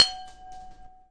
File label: glass glass double, Crystal Glass Strikes; UNUSUAL KITS and FX